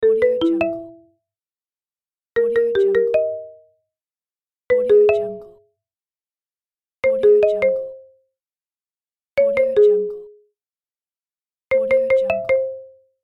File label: marimba notification pack